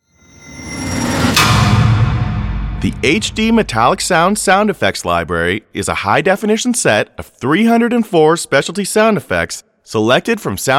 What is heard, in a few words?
metallic sounds; sound-ideas; hd-metallic-sounds